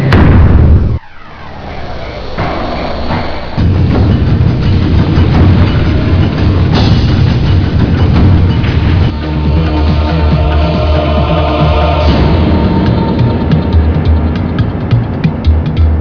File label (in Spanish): tren